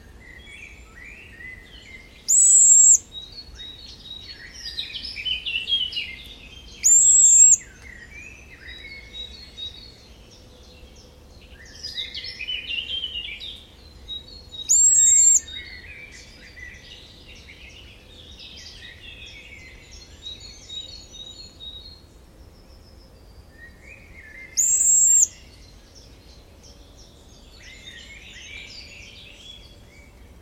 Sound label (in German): sperlingskauz